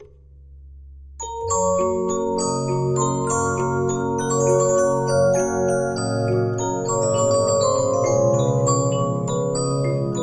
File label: Regina, 15inch disc musicbox